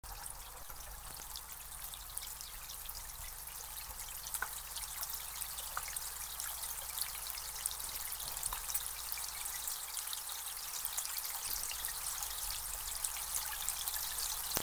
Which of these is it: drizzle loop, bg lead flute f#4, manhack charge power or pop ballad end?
drizzle loop